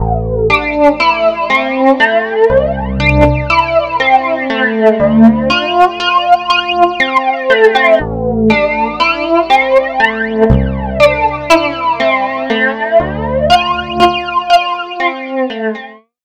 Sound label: Stereo Flanger Jet Plane Takeoff Flange Guitar Wet; Sonicircuit; Syntheway